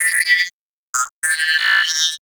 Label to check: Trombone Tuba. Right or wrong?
Wrong